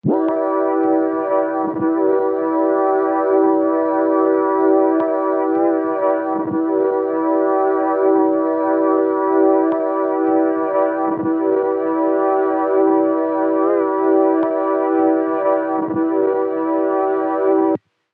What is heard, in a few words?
Tape Loop Pad - E Min; Pads; Cymatics - Eternity Sample Pack